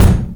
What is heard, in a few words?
puffy top end bass drum g key